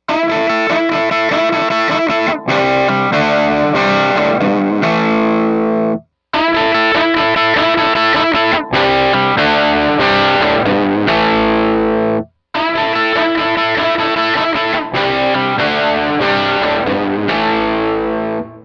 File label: rock